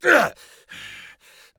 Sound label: bear2 hit; Escape from Tarkov